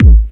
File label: K808 EQ, EQ KICKS; Masterbits - TR-Minator